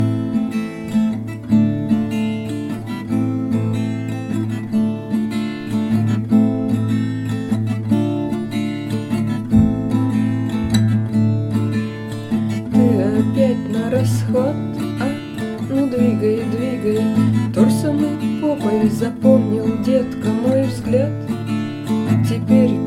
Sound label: Horror